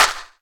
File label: clap; HeavenStudio; kitties